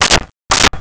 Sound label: Hammering Nail into Wood; Tools